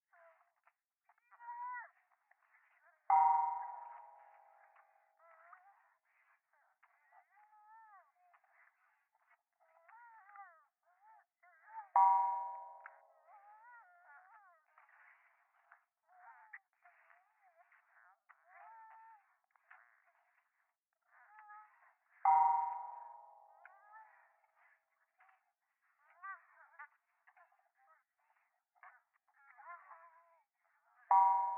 電話 イラマチオ 神社

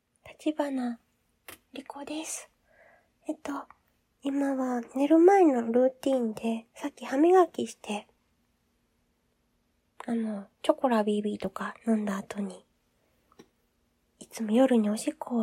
おしっこ実演 寝る前のおしっこおならを添えて編; おしっこ録れるもん。

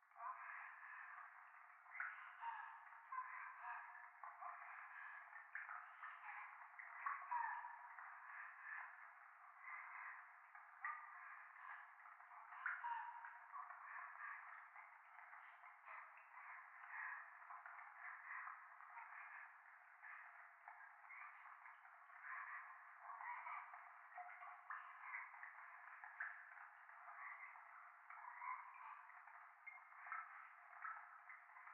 教会 右耳密着処女喘ぎ 雨上がり

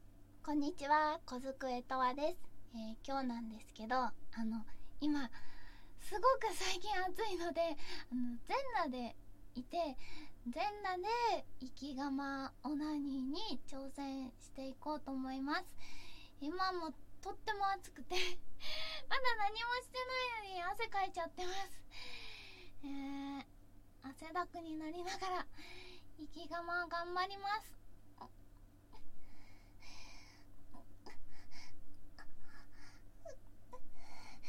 オホ声 全裸でイキがまオナニー

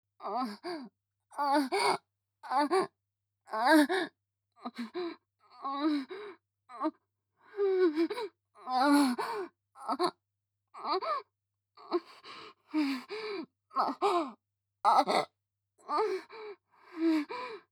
正常位・喘ぎ・ゆっくり; ヘレナ

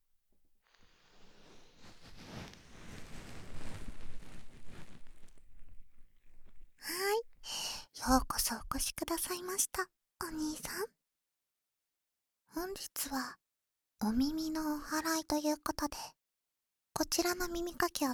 左耳かきパート, ASMR; 左耳かきパート(元右)

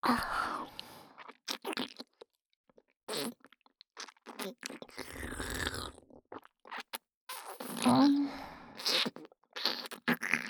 妖艶 ねっとり左耳フェラ; フェラ素材

